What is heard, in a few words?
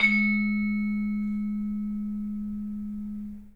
vibes; 3D Sound; 3D Keys VOL-1